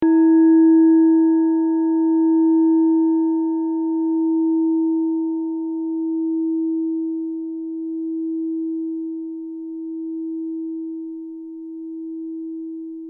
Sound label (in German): klangschale nepal, Klangschalen Nepal; Nepal Klangschale Nr.30 Planetentonschale; Klangschale-Gewicht: 1190g